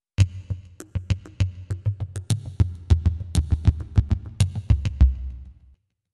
percu jingle

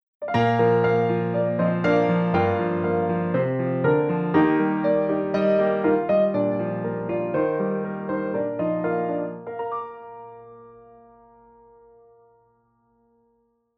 piano jingle

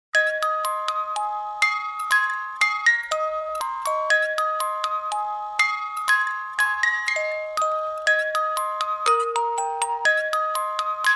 music box

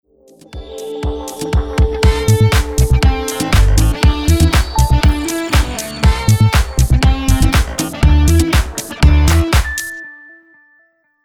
happy mood

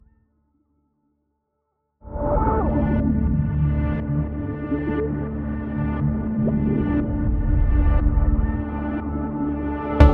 implosion

